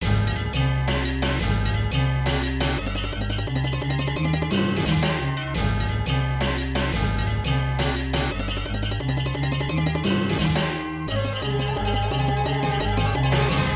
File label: Unused Jingle; Tao Taido arcade